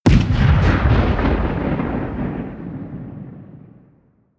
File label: artillery heavy distant